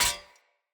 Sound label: Short Sword Impact Parry Sword; Weapon Impacts; Enemy; Swords; Sinecure Short Sword